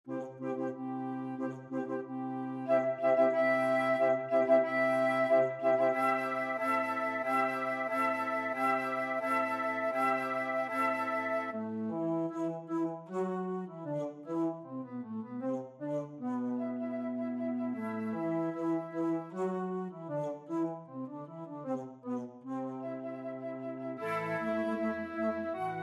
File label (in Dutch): fluit; Marche Royale de Lion; Carnaval des Animaux; Camille Saint-Saëns